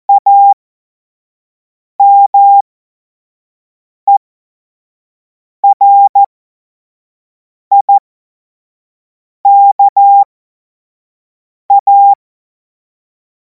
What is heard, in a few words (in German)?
Witz, Morsekurs, 25 F70; Tempo 25 F70; hamradio; cw morsen